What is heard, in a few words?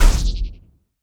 ball hit hard; CrossCode; battle